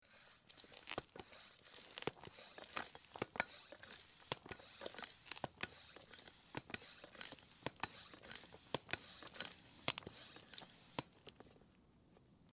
nasos velosiped, zvuk ruchnogo nasosa

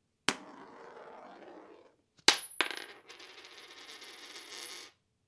moneta upala so stola

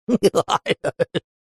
smeh multyashnyi

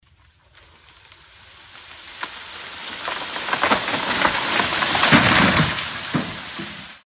derevo upalo v lesu

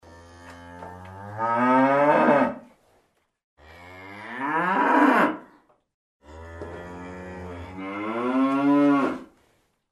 mychanie korovy v stoile

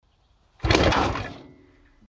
zvuk shvorki puskacha

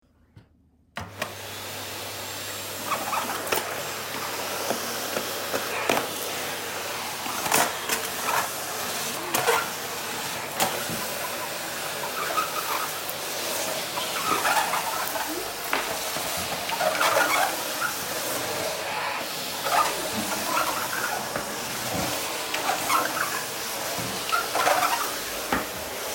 pylesos rowenta, zvuk pylesosa

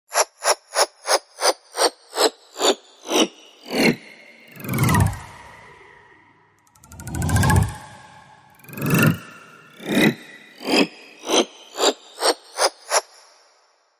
reversivnoe dvijenie nazad

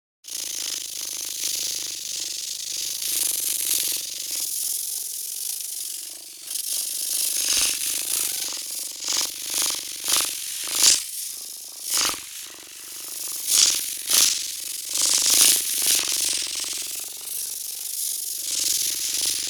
kolibri

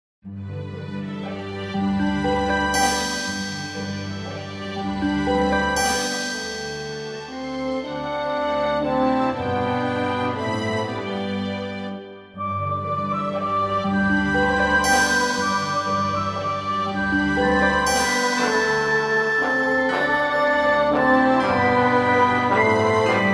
rojdestvenskaya melodiya

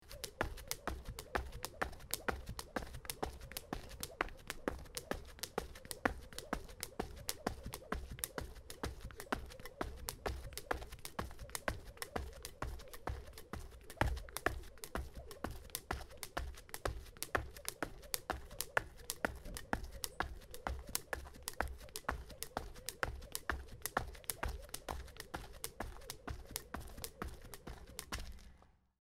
skakalka

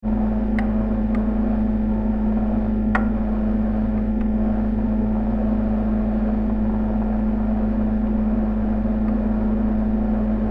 morozilinik

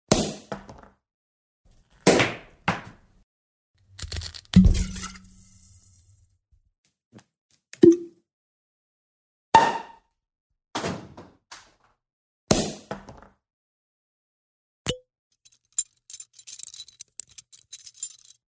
raznye zvuki otkryvaniya shampanskogo

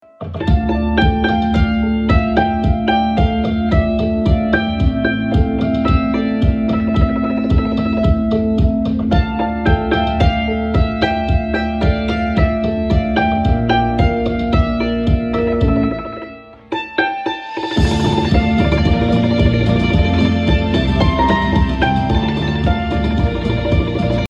cymbaly

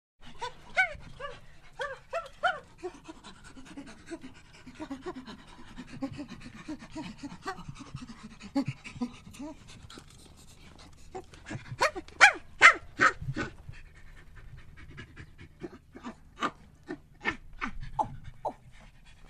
orangutana

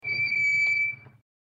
pisk kolodok pri tormojenii

